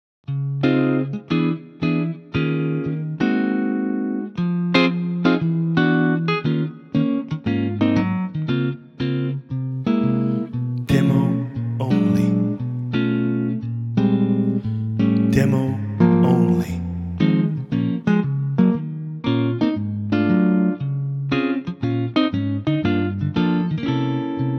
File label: Db sunburst guitar